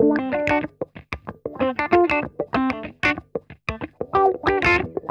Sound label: ROLLER, Funk Guitar; Zero G - Funk Guitar